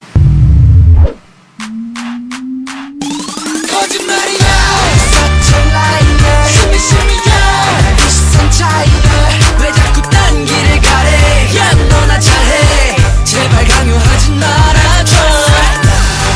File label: korean ringtone